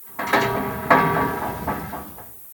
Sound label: Metal Bars Random; The Town